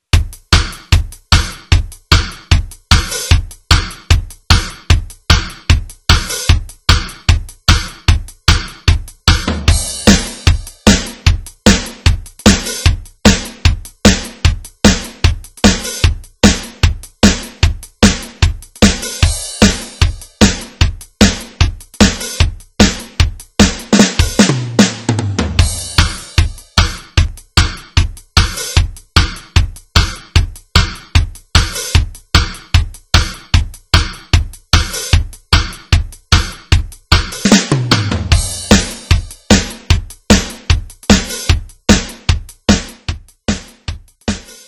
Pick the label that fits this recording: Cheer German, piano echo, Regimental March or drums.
drums